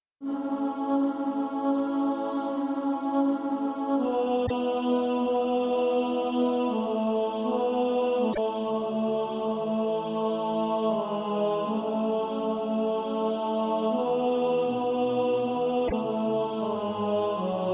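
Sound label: tenor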